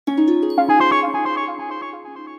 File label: Promote Notification